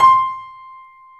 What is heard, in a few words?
Small Pianos, 3-D Audio; E-MU Producer Series Vol. 5; 3D Multis